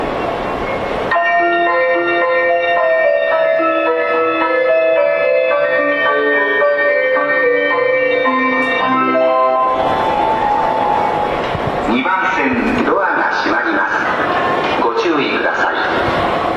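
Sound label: Departure Melody; 埼京線・湘南新宿ライン; 池袋・大宮方面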